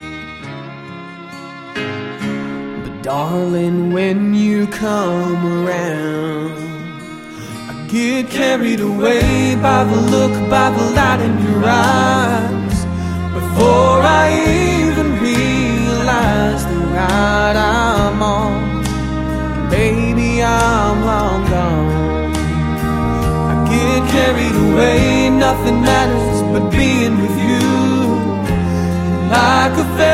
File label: Country